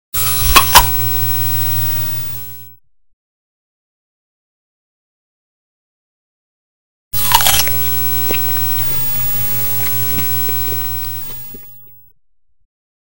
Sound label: something being eaten; SOUND EFFECTS1